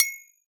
war ui source hit metal bolt against metal baseball bat drop; hardwood